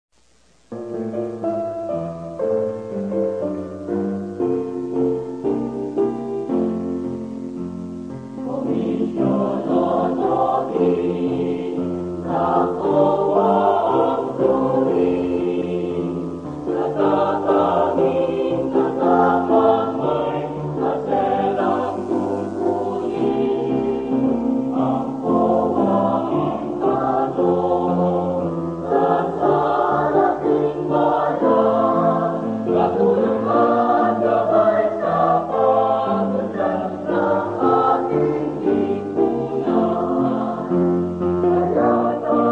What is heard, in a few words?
Hymn